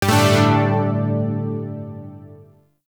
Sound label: Shawzin Pent Maj Chord J; Chords; Warframe; Zariman